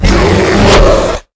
slash; slender; quadralex

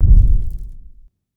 garg far step; slender

